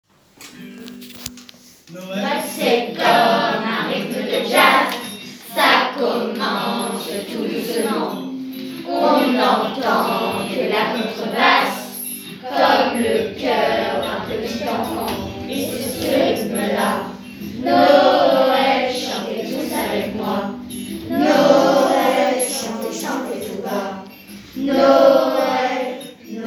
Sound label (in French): Noel Jazz